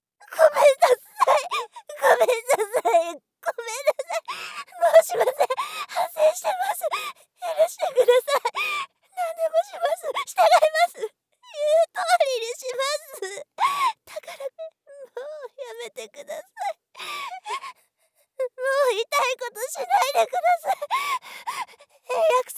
泣きながら

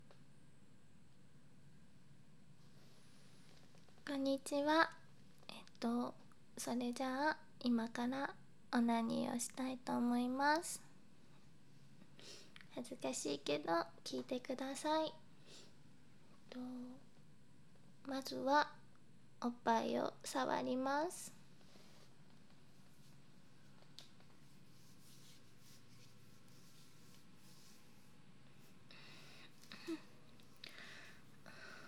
オナニー生録音

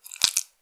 膣外射精

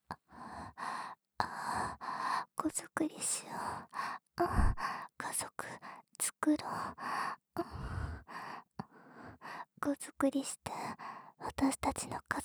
喘ぎ

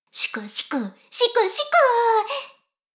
しこしこ音声; 牧場戦腺; 少佐殿

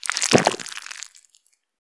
種付けゴックン射精; 膣内射精

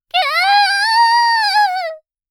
きゃああああ！, 母親

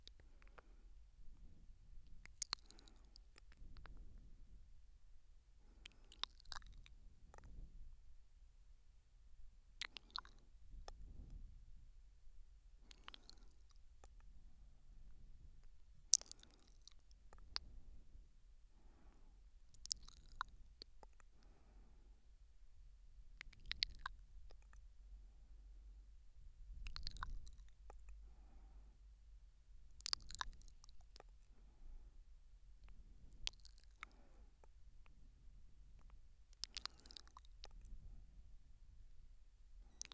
右耳甘噛み

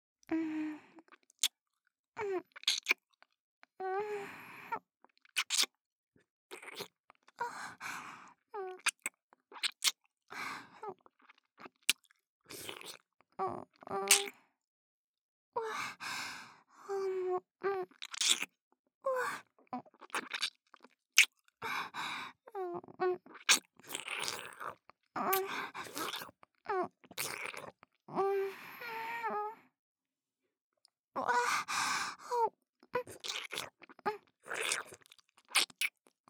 ディープキス【ループ】; ループトラック

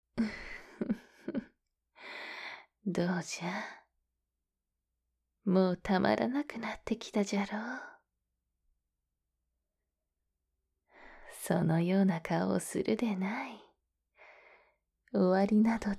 左右で舐め、舐め(両耳─耳舐め)

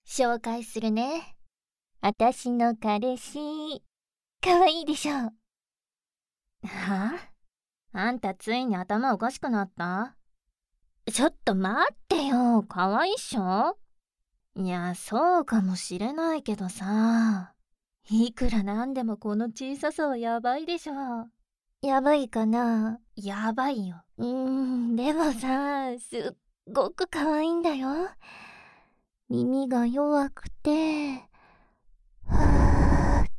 両耳舐め, ASMR; なんだかんだ優しい黒ギャルなお姉ちゃん; 双子の姉！？

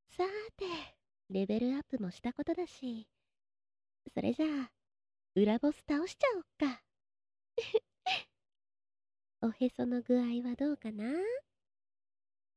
耳舐め, ASMR

